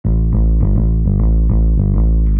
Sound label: Electric Bass; Basses